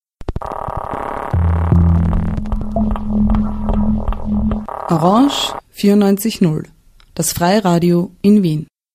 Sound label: jingle; orange